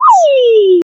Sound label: swoop